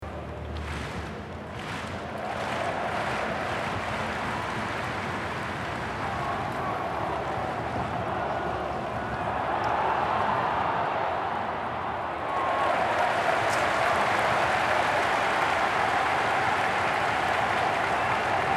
Fussball vorm Stadion

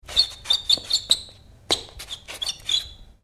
quietschen

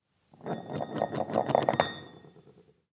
Metallstueck

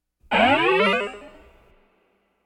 Science-Fiction Sich Aufbauende Energiefelder

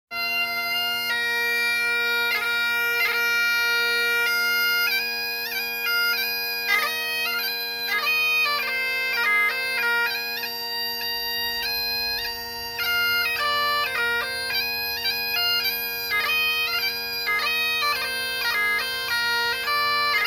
Instrumente Dudelsack Schottische Melodie

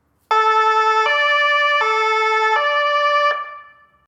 Martinshorn Einsatzwagen

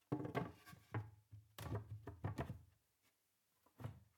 Kiste

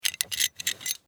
Kleidung Guertelschnalle

